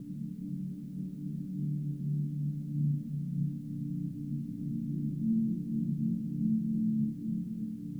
Drone Ambient; Drums & Drones

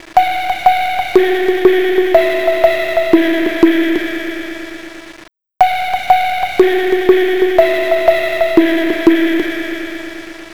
Ambient Melody; Melodics; Drums & Drones

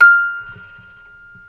MUSIC BOX F4

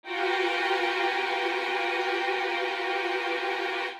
Strings Trill, cinematic drama Soundbeds; musicradar

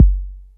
Kick G1, drum machines

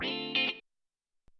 Gtr Hit

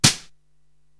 SD Brush Tap, Korg TR-Rack drum machine

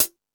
Lazer Drums, E-MU Pro-Cussion; drum machine

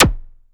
ZAP, MACHINE PERC; Zero-G Ambient 1

